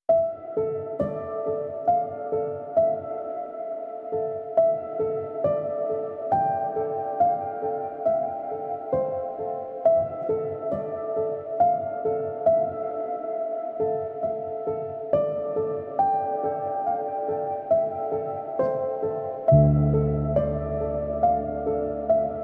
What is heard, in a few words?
Only Piano